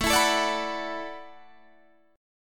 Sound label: E 7th Suspended 2nd Suspended 4th, A